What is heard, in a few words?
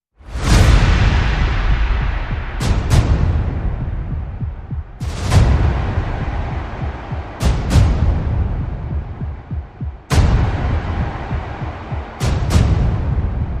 Percussion and FX